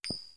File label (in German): spieler nimmt muenze; jazz jackrabbit 2